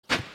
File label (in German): hacke; Stardew Valley